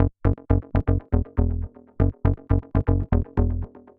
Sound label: SH101 Bass Loop (120BPM), retro house